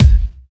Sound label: mid heavy kick sample F sharp key, Royality free bass drum single hit tuned to the F# note; kick drum samples